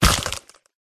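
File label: wyvern attack contact bottom; viverna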